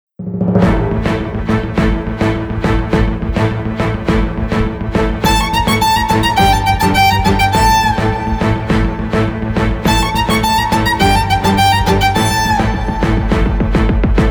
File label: Instrumental